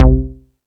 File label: Rap Session, E-MU Pro-Cussion